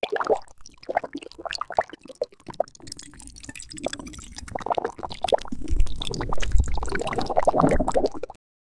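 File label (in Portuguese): órgãos internos de um alien, gore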